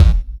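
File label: bass drum, right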